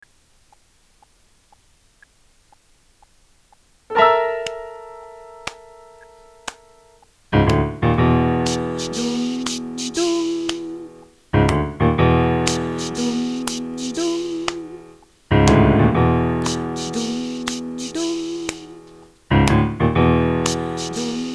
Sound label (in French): 2 voix panthere partie 1